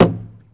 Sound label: Baseball Hit Wall